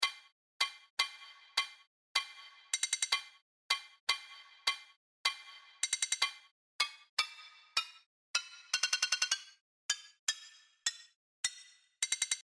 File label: Perc SFX